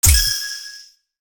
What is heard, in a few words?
shining sword